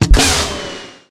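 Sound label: Weapon launcher fire; rockit launcher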